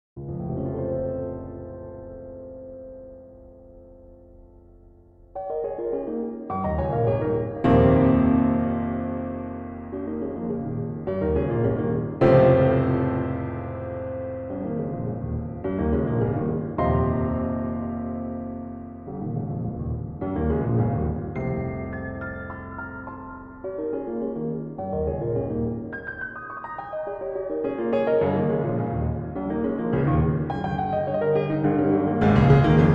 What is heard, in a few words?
Quarter-tone Piano; Quarter-tone Piano Prelude No. 10